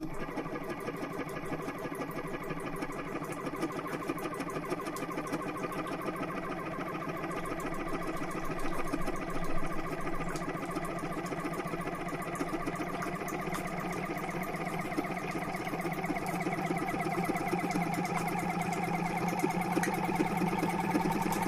Machine Rhythmic Pu, variable speed

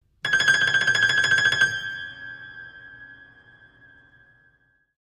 Piano High Trill, keyboards piano

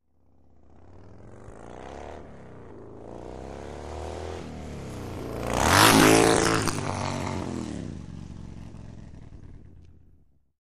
Harley Motorcycle By, harley-davidson ext bys

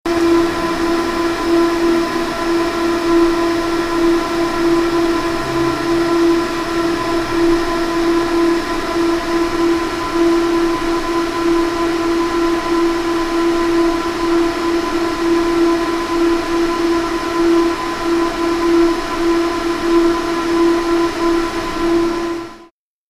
Engine Heavy Hum, factory

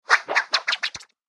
Comical Multiple Swi, whooshes

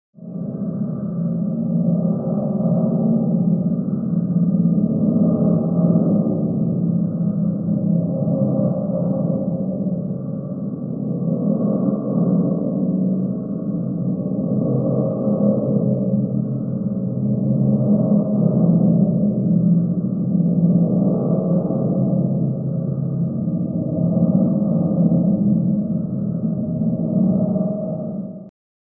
Glass Evil Ghostly Dr

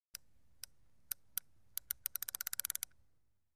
Fishing Slow Clicks, fishing

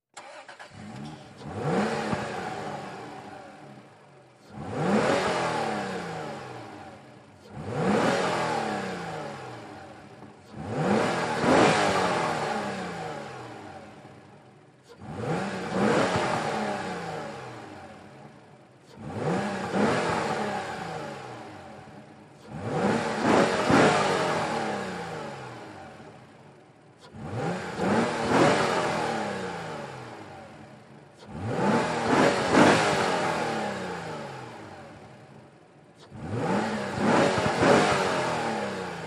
SUV Engine Rev Off

